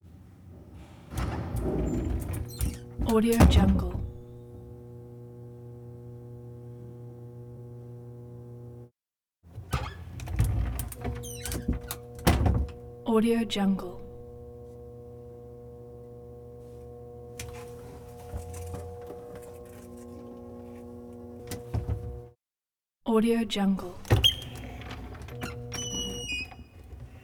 wood phone booth door open and close creaks

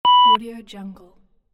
censor beep

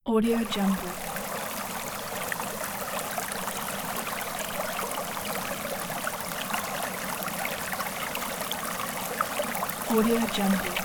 bubbling stream

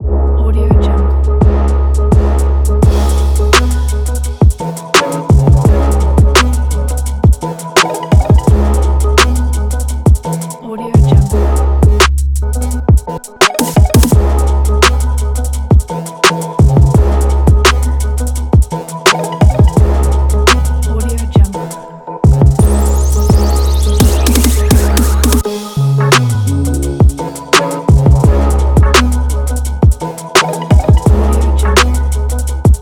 mysterious beat

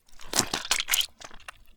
foley gore squish spurt spray squirt; leak blood

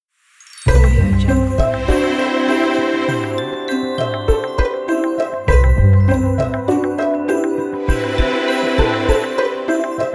cinematic xmas logo

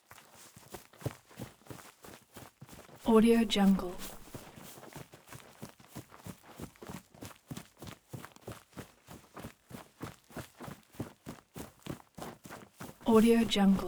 feet boots group run grass; soundmorph

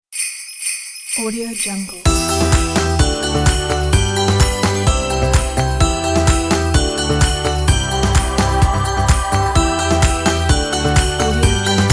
christmas music

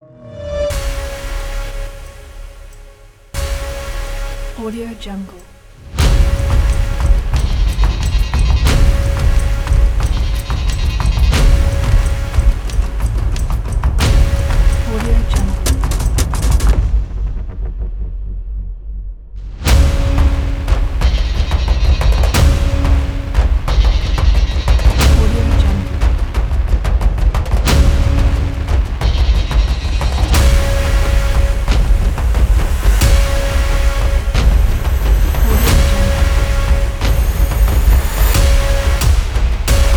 great modern action massive opening

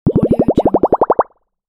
bubbles

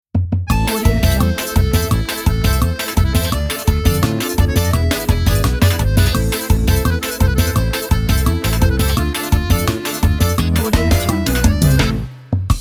arrasta pe logo

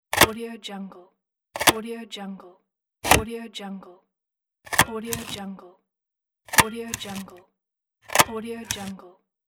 hole punch

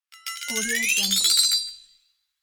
cartoon funny flexitone warble

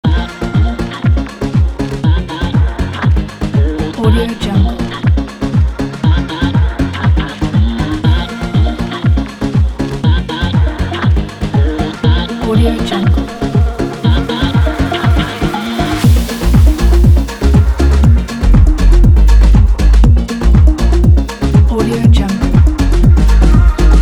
lofi house

